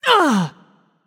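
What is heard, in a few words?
grunt hit, female; smite; voicepack; hera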